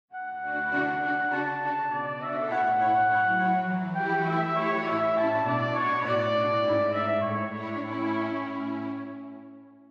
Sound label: with tpt